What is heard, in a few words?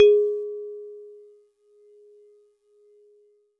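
Celeste DX100 G#1; Pristine Celeste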